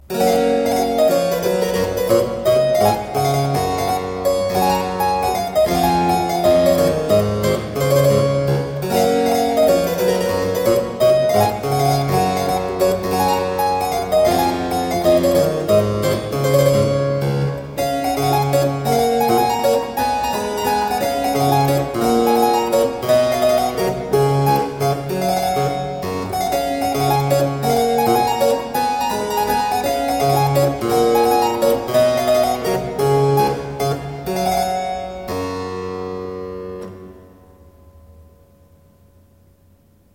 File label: Suite in G Major - Minuett, Keyboard Music, spoken; William Croft